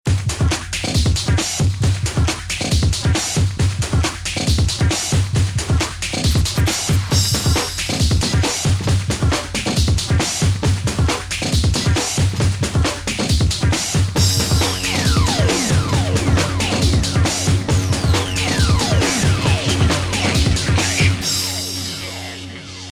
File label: techno